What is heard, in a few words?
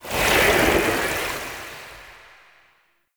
Fishing Spear Fish Menu Close; Weapons; Warframe; Eidolon Fishing Spears